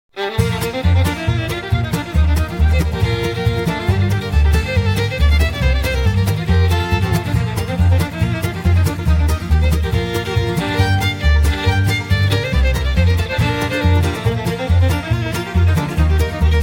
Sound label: Blues